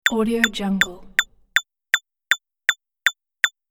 time count gaming fx

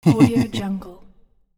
man giggle smile

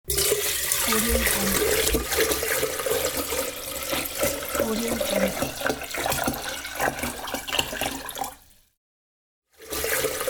pouring water in pan; kaelv

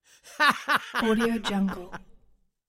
human laughter male hearty

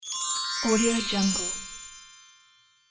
sparkling chime transition

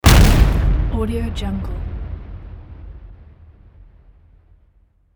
explosion

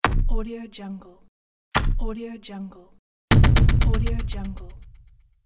boing impact

